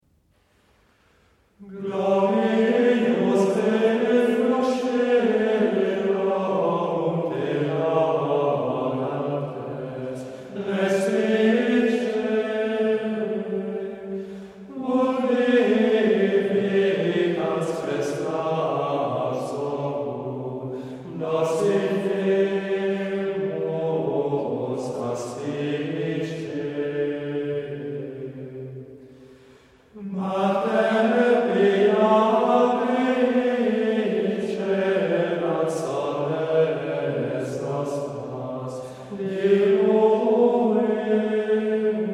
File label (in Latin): Gloriose Flos Cœlorum Hymne